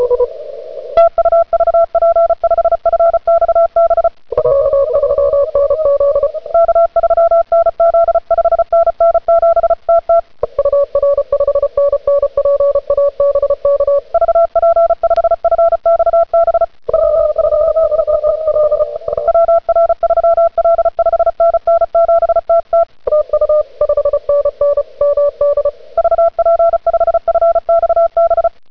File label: pileup; Saturday Morning on 15 - (Operating); On the Air Audio